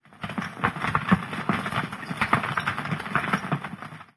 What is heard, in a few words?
building rubble, streetwar; ambient, levels